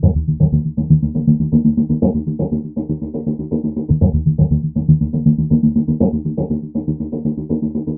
hi NRG filto synth bassline; MELODIC SAMPLES

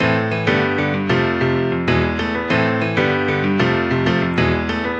096 salsa piano; KEYS ORGAN PIANO LOOPS

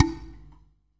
Medium pot hand, Clay Pots; ETHNIC and WORLD PERCUSSION

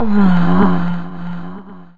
sigh stretch; VOCALS and SPOKEN WORD

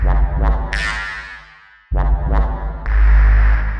126 acid whup whup verby; SYNTH AND ELECTRONIC LOOPS

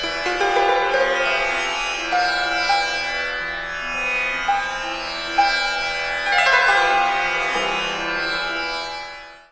Bhupal Tori Swar; Far East Drones and Plucks

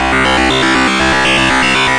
120 persistent synth melody; SYNTH AND ELECTRONIC LOOPS

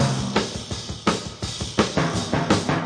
DRUM LOOPS and BREAKS, 168 bpm; 161 to 180 bpm

